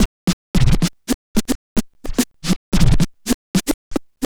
Turntables

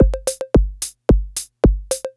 Dance Drumloop; Drums; Dance Beats

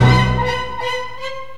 Strings

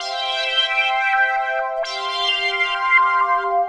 Pad, Synthesizers

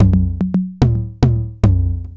Electronic Percussion

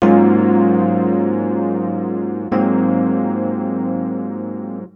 Electric Piano, Loops, Keys

